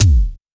bass drum a key, kick single hit tuned to the A note. Loudest frequency: 1271Hz; kick drum samples; single hits one shots